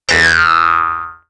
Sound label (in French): echec; MOBICLIC41